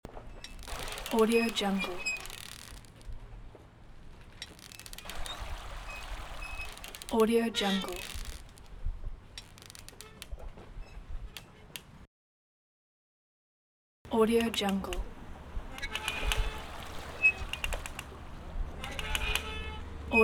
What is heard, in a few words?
moored boat creak